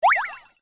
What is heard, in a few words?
start